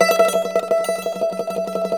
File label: mandolin